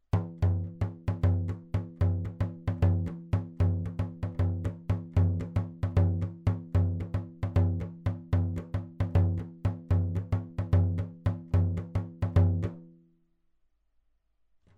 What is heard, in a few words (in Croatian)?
bubanj